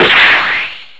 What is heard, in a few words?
wall jumps